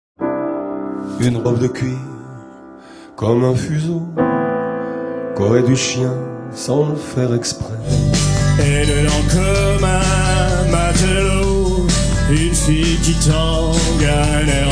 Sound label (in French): Chorale